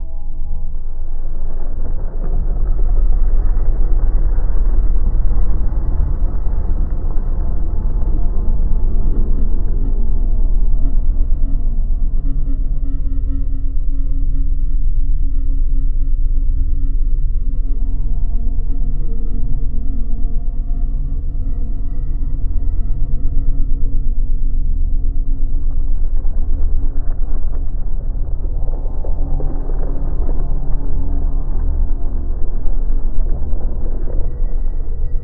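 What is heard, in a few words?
lithoid ambient science ship; Stellaris; lithoids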